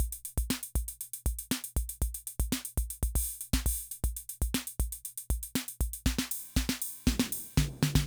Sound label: Loop, rhythm 55, classic machines